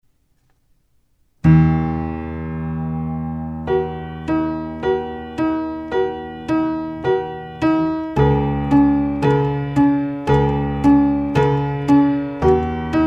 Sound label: Piano Concerto